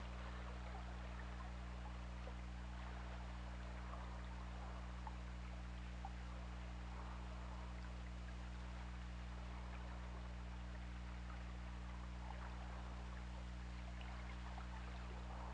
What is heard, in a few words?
Orca; OrcaLab